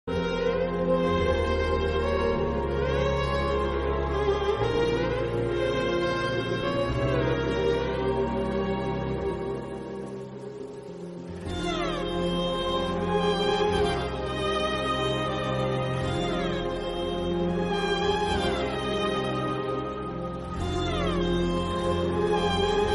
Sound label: baby emotional bgm ringtone; Anand Deverakonda